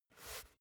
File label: basketball turn swipe short quick